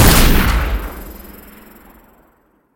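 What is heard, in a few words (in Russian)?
подводная лодка выпустила торпеду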